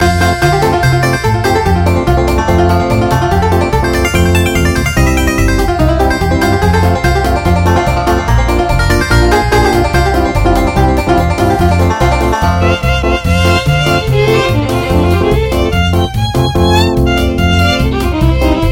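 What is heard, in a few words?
Hoedown, MIDI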